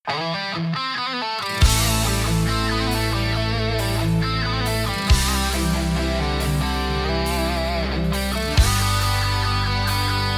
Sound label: nostalgic uplifting emotional